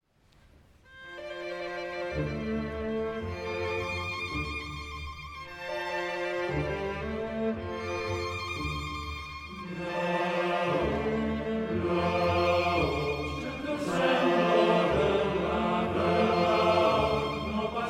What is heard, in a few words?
maleChoir